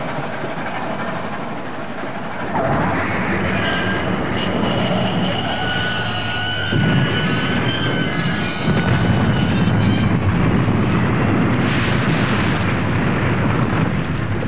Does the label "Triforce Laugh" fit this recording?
No